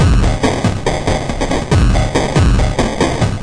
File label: 140 totally degraded bitcrunch, drum loops and breaks